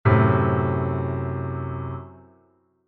F♯° chord inversion

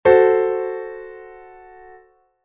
F♯°, Chord Inversions

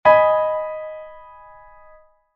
G♯m chord inversion

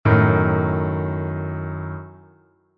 D♯°, Chord Inversions

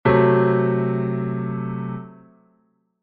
F♯°, Chord Inversions

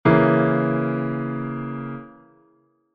A♯° chord inversion

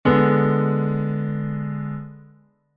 G♯°, Chord Inversions

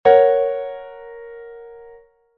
F♯, Chord Inversions

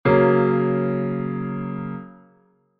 F♯, Chord Inversions

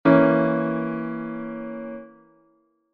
A♯° chord inversion

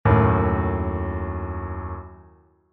D♯°, Chord Inversions

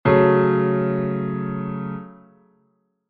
F♯m, Chord Inversions

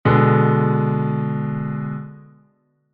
G♯°, Chord Inversions

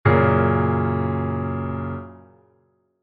F♯, Chord Inversions

